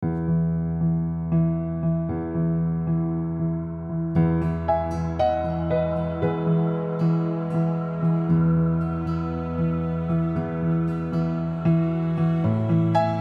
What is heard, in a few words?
Midtempo uplifting, Music